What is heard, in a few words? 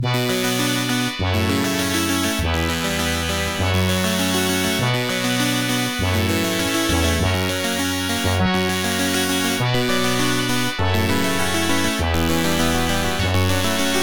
OPL3; Tetris